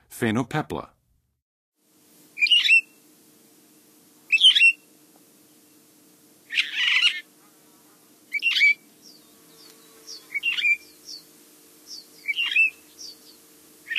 Phainopepla, Songs of Birds; North America; Western USA; Western USA Songbirds

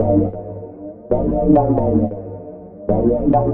Synth, Techno

